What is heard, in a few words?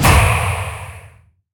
gorilla Mask Land Add; gorillakingdom